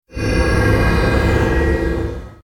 snare effect

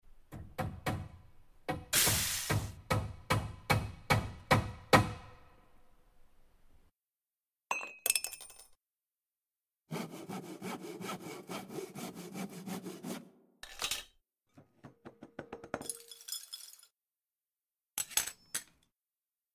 ambient city market

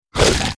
horde biter bite; mobs

